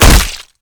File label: hit flesh; garrysmod; fubar